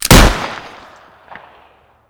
pistol knife hit; tf2

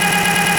snail gun spin; tf2

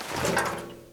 gas can inspect movement; tf2

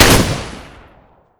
fire cannon shoot; tf2

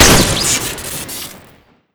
fire cannon shoot crit; tf2

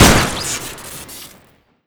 shoot, weapons; tf2; bigkill

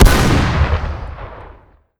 revolver shoot; weapons; tf2

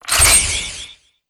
rifle reload; tf2

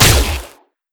neon beam fire; tf2

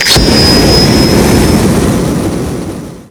afterburner start, weapons; tf2

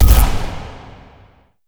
explode, weapons; tf2; alcoholics4thlaw

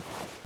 draw pistol knife movement; tf2

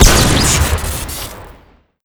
revolver shoot crit; weapons; tf2

